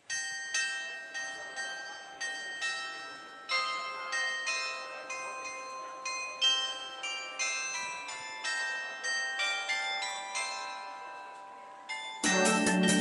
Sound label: Glockenspiel; Goslar-Lied